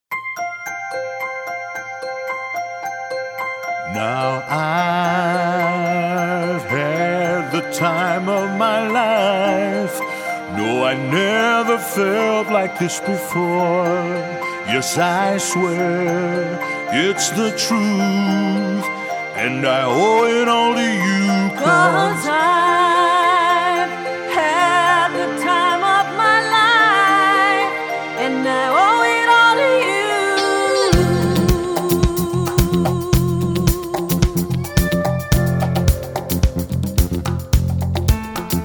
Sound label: soprano